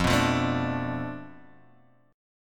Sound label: F 6th Flat 5th F, chord